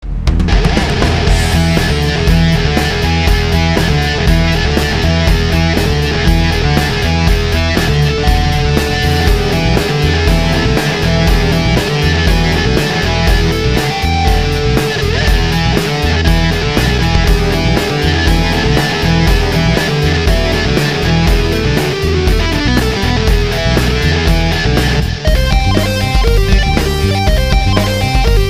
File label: guitar